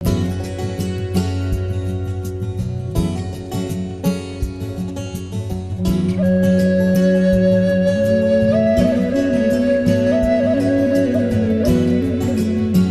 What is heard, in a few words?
Flute; PassionFlute